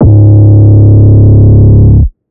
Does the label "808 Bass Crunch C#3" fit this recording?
Yes